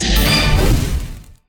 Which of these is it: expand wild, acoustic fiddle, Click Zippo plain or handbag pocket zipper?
expand wild